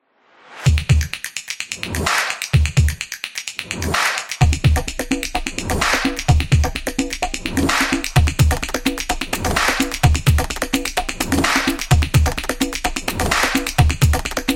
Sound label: Action Drums; football drums